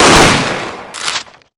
damage; dmc-dante

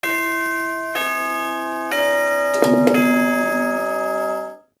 ding dong ding dong

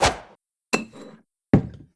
wine catch; barok

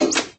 announcement off

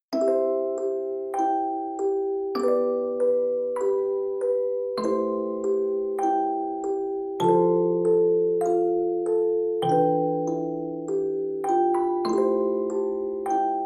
music box; dgs2; the great turnabout never ends

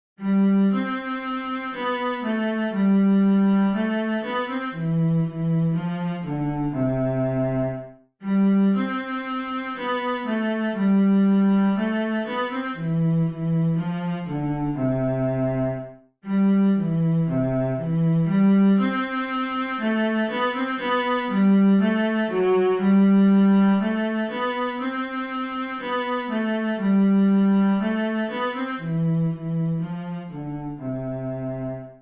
cello

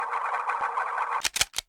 gun spin; dmc-dante

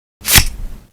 business card; retinz

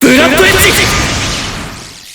overdrive; bb-kurogane

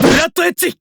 sword; kurogane

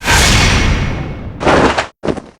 transform; bb-tsubaki

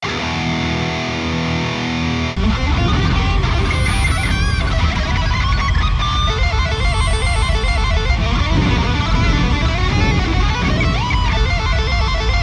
breakdown; metalman

